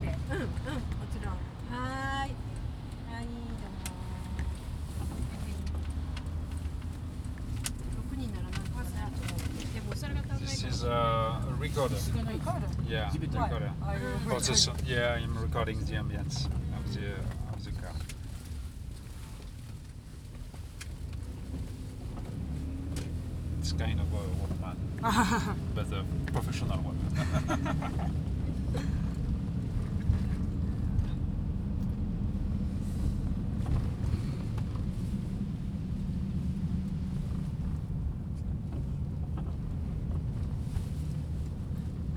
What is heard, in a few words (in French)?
en voiture; FUKUSHIMA; janic team